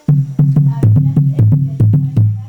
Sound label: Drummed